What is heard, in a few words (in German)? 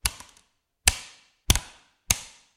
Lineal klatschen; Geräusche zu den Klangexperimenten